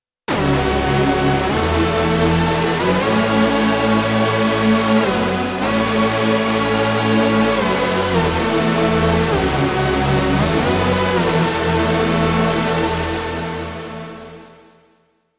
Only Chord; KOPRO+

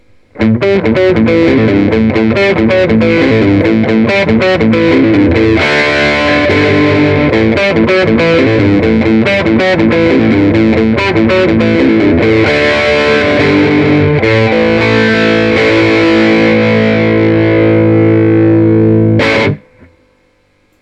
FW15R Overdrive

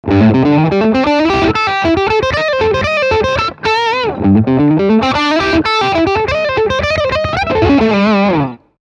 Crunchy Lick

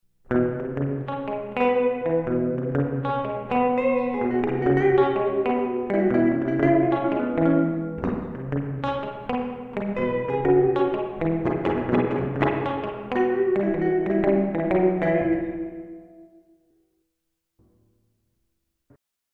Specular Tempus Reverb Spring

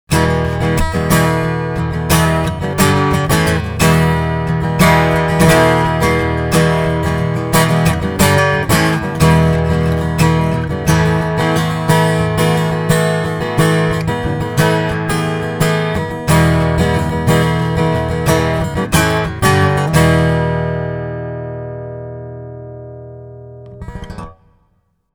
power Strumming

